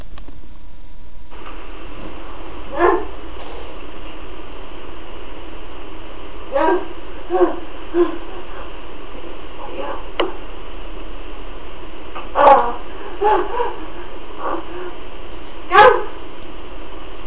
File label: masturbation